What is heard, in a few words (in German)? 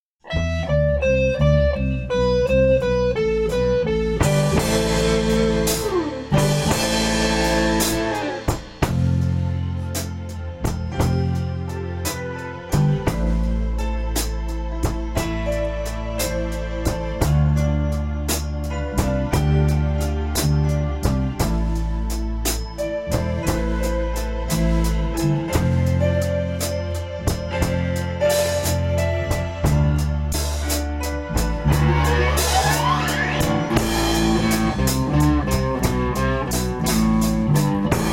Instrumental